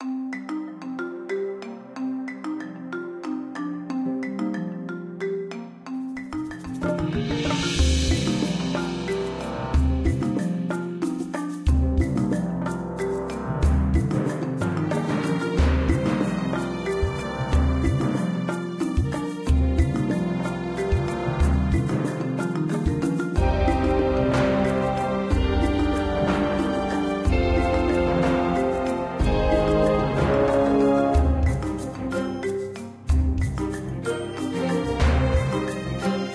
Jungle; James Cameron's Avatar The Game

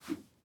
throw; teardown ps5 windows xbox series xs gamerip 2022

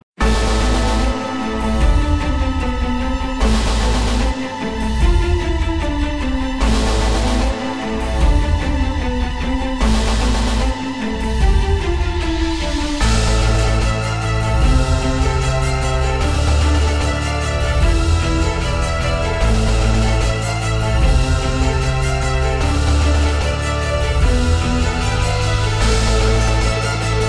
Rapid Fire; disney infinity 2.0 marvel super heroes gamerip

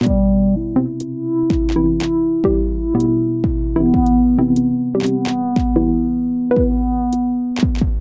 loop lead oboe drum; oboe2; Vilmonic

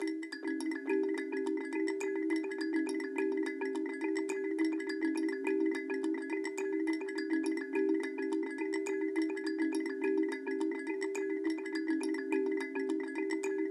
Calimba; raji an ancient epic

